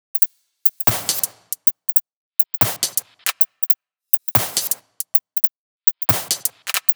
Bpm138 Top Loop, Dubstep; Morello

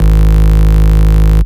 Bass Shot G; Mutekki Media; Vengeance Electroshock Vol.2; Bass Shots

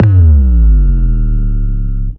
Kick

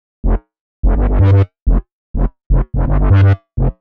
126 F G BASS, Funky; Loopmasters; Funky Underground Vol 2; BASS LOOPS

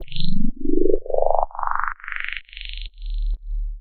swoosh; Loopmasters; Funky Underground Vol 2; FU2 SWOOSH FX

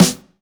Claps & Snares

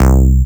Bass Shot C; Mutekki Media; Vengeance Electroshock Vol.2; Bass Shots

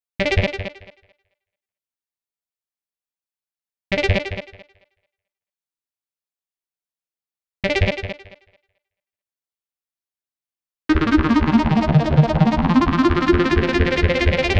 129 G Synth, Bpm129, Complex Electro Synths; Prime Loops; Androids

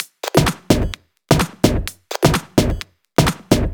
Loop, 128 BPM

